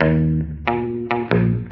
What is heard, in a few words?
bass, Phonky; loops